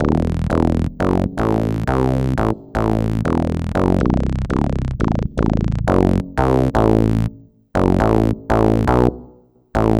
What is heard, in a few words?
Bassss; Sonic Foundry (Sony Creative Software); Synchro Funk